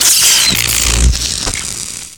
weapon crit charged off; tf2

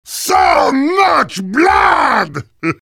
cheers; heavy

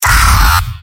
m pain sharp; demoman; tf2; garrysmod; mvm

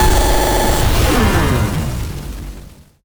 over charge shot, weapons; cow mangler; tf2

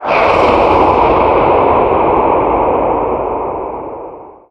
male scream; Garry's Mod; tf2

